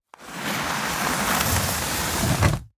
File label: armchair sliding on wooden floor